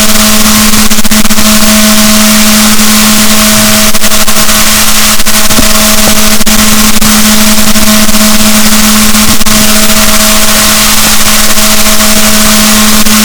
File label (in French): rorquals positif, BalaenopteraPhysalus, boat; SNR 0dB